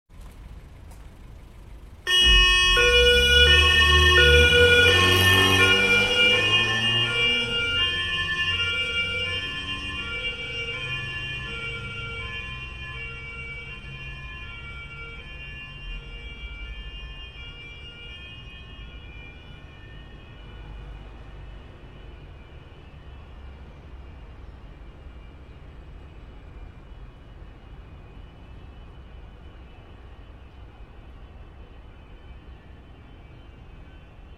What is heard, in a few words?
Police Siren Pull Away; Sound Ideas; Series 2000